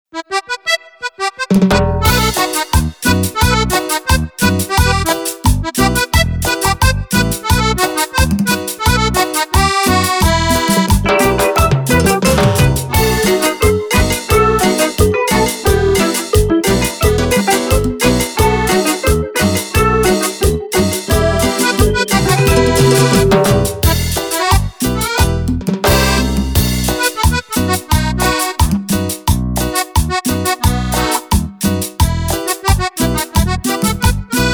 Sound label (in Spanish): cumbia